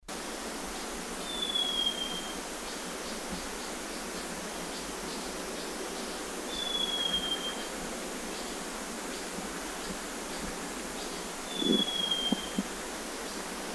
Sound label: Eyebrowed Wren Babbler Ssp Laotiana Song Guangxi Da Yao Shan; petite turdinule napothera epilepidota ssp laotiana